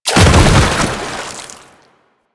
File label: Bodyfall Water; Warframe; Lasrian; Lasrian Soldiers; Foley; Body Falls